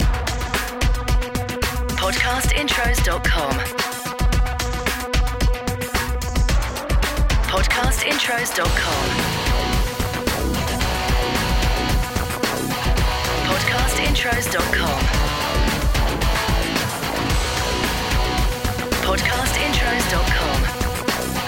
Podcast Watermark ROCK

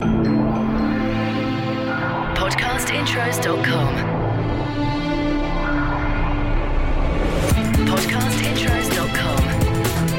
BREAKBEAT Esprit; Podcast Watermark

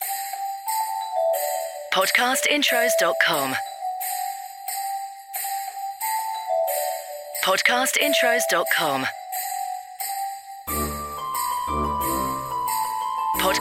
CHRISTMAS Ding Dong Merrily on High; Podcast Watermark

